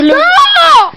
stop button

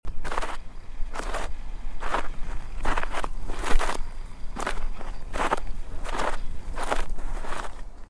snow steps

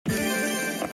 skateboard trick

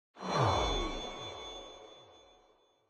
spell drop

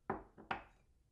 cup onto counter

